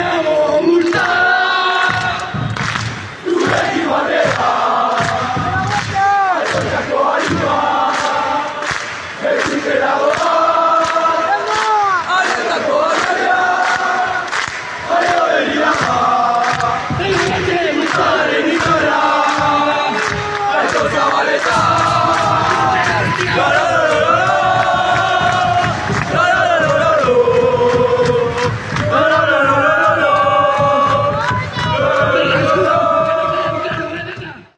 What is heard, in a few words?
hemen dago bultzada fan chants